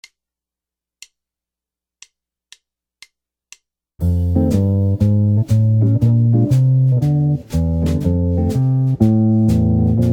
blues walking bass

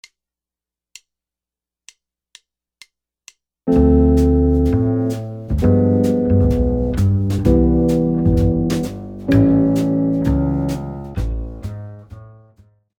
jazz guitar comping

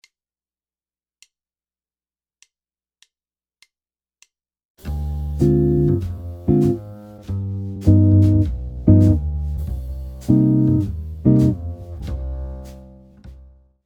jazz chord progression, guitar

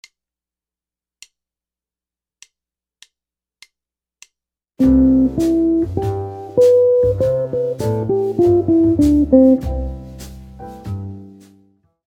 bebop, jazz guitar

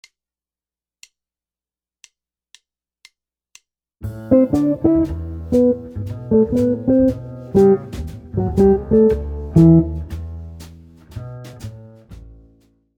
licks, jazz guitar

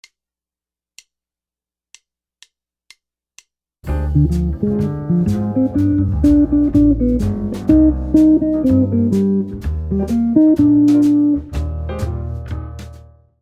minor ii V I arpeggios, guitar

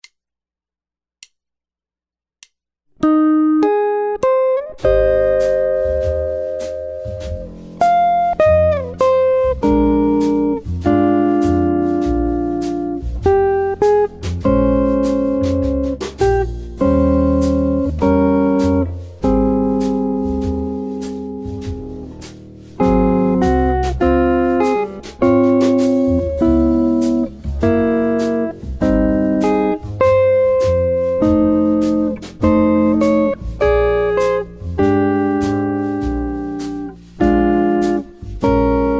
chord melody, guitar

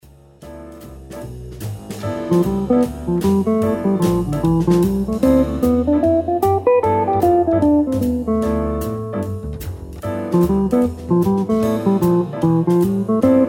mixolydian mode guitar lick

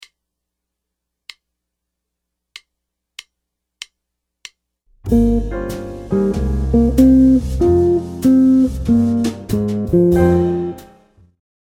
ii V I licks, jazz guitar

